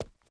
Footstep Run Base

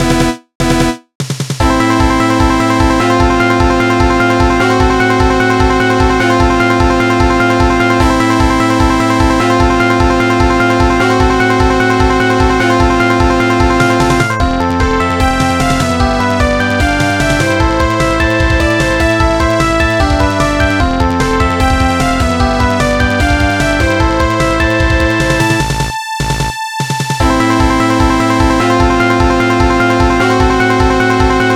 Miniboss; bart bash; S3&K

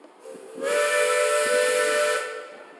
train whistle railroad

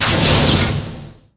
Damage Lightinig

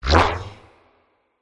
Sfx Tennis; Kinect Sports Season Two; Xbox 360

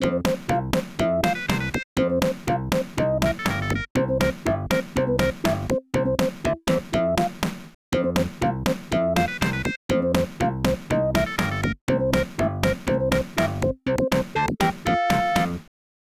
Level Completed!; Goofy's Hysterical History Tour; genesis

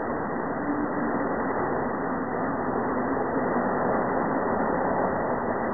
Wind

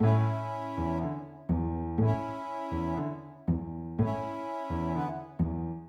Fading; The Act; Arcade, iOS, MacOS, Mobile

